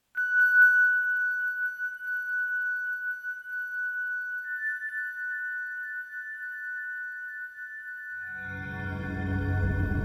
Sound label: Bell Threshold; Salisbury